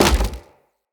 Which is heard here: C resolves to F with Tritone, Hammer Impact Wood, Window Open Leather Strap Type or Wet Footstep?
Hammer Impact Wood